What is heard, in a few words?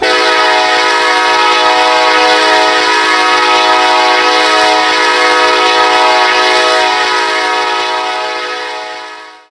k5lla, horn; run 8 misc horn pack